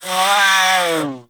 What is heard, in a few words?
Feral Vocal Death D; Warframe; Catbrow